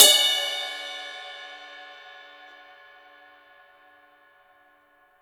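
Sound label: Cymbal, Real Drum Kit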